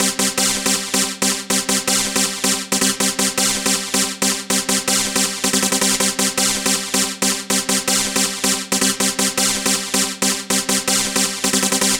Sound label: Dance Synths, Hardcore Loops, Synth Loops